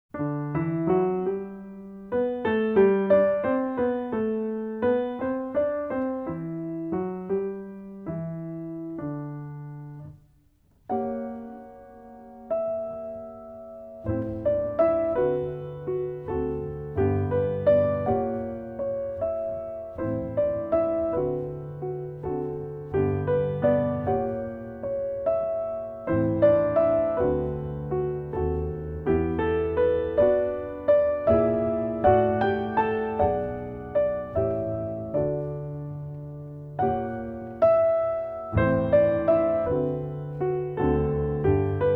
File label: Instrumental; Heliotrope Bouquet